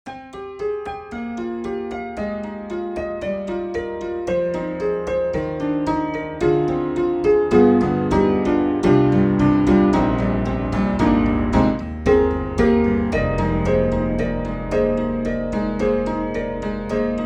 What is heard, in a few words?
Tack Piano Ancient Tack; Upright Pianos; Synthogy; Ivory II